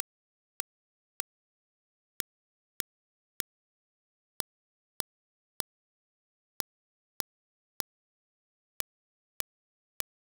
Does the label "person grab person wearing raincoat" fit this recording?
No